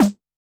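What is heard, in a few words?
Electro Kit Snare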